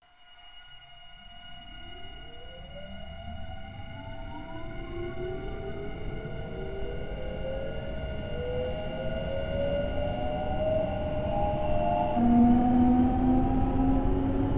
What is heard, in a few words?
motor noise; semboku 7020